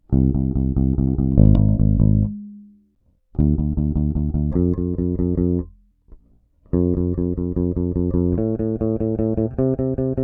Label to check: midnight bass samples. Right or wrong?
Right